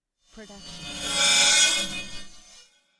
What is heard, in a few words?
horror metal chaos; soundscrate